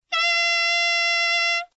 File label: G6 alt, saxophone tenor